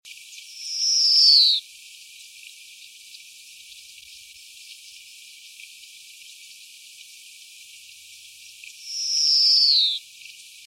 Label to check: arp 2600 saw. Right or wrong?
Wrong